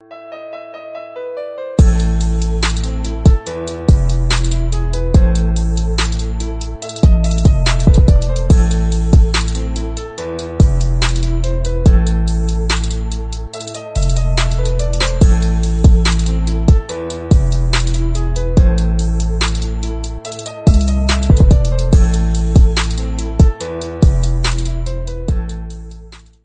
fur elise remix bgm theme

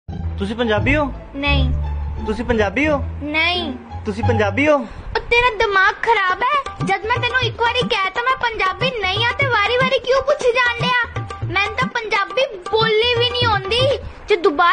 punjabi

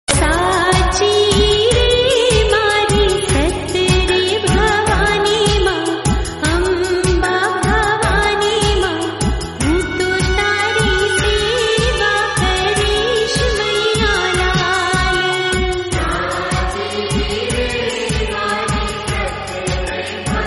devotional gujrati song

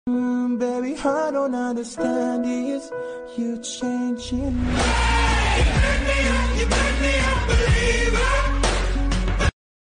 girls attitude song in tamil

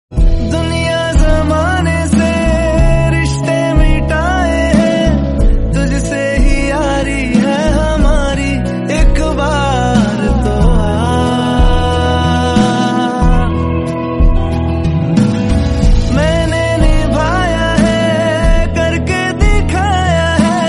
song

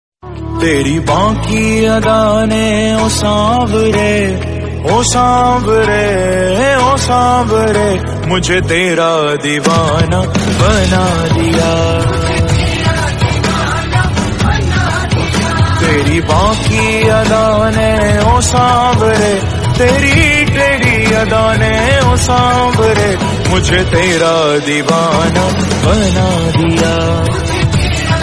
devotional hindi

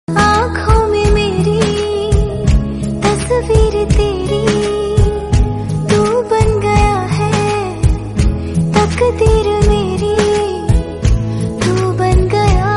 hindi love

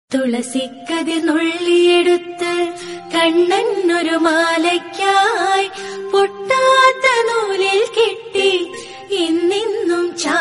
devotional, malayalam

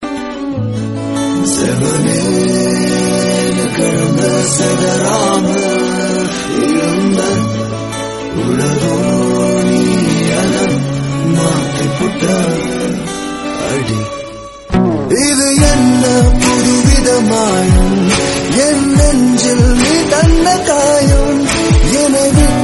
oneplus alarm tone

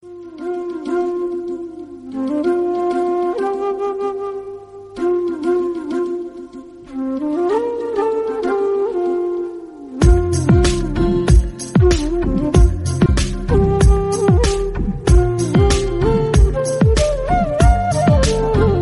flute; kaala; rajinikanth

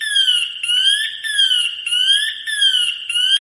pass alarm; scotts

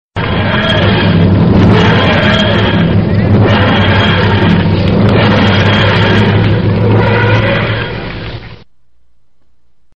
bass bass bass

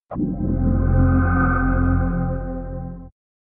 ps3 sound

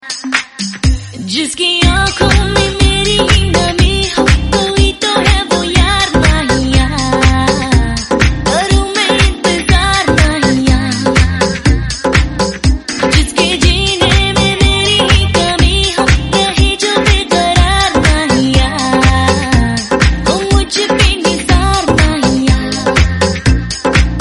flute; awarapan

